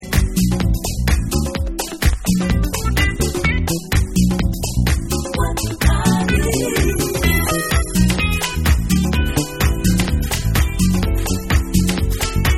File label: Dubstrumental